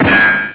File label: ricochet; Insaniquarium; popcap